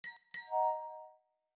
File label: Windows Battery Critical; Pearl